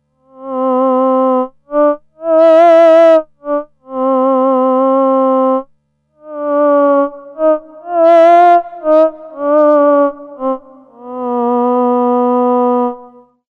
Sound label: theremin